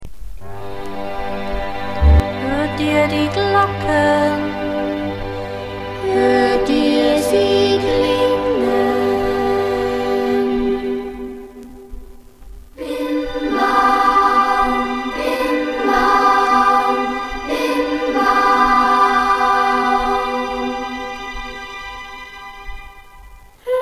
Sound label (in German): hochzeitsglocken; olympiachor